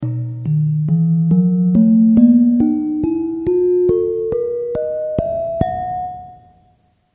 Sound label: gender, GAMELAN